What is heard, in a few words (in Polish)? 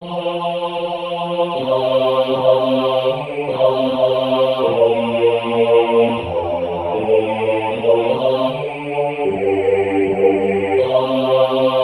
Bas solo; Aniol pasterzom mowil